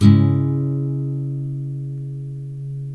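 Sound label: NYLON, C# MINOR UP, CHORDS; Spectrasonics; Hans Zimmer Guitars VOL-1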